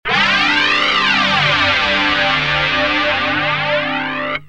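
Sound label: guitar dod r870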